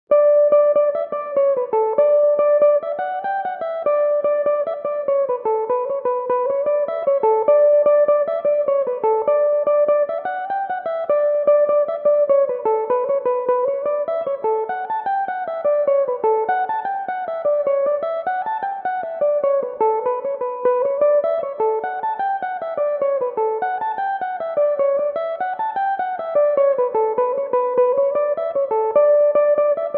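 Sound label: irish D major belmuse, irish mandolin; irish merry making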